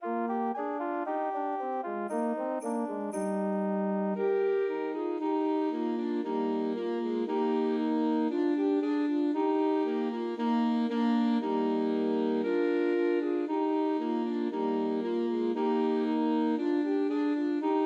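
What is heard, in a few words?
Deck the Halls, Christmas Trios for Three Alto Saxophones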